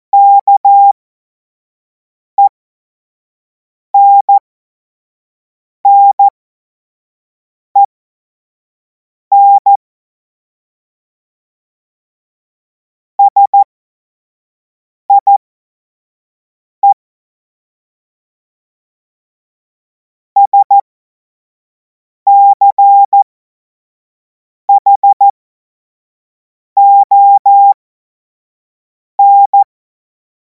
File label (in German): Witz, cw morsen; Morsekurs; Witze/Joke